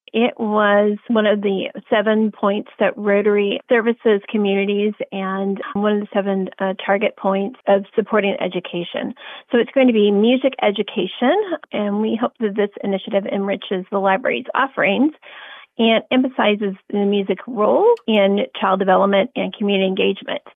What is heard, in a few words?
Chimes, pentatonic chimes; youth library